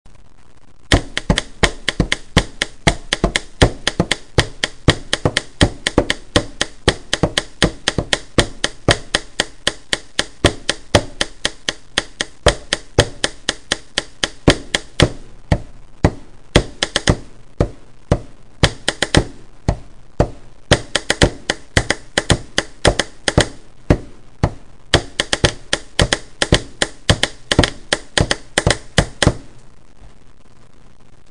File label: finger pen drumming